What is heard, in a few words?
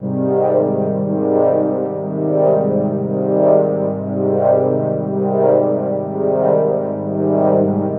Dub Drone Ab7 120, Dub Techno; Ghosthack; AC2023 - Day 17 - Dub Techno & Deep House Chords